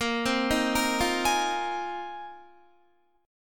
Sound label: Bb 7th Suspended 2nd Suspended 4th Bb, Guitar 4F 4A 5C 5G 5C 6E; Bb7sus2sus4 arpeggiated; 17,15,15,15,17,16